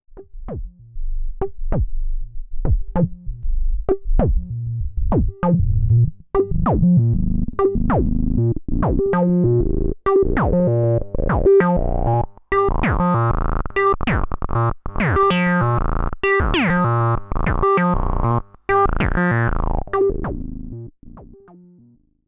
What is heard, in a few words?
Liquid Foam Loop; HerbsAndStones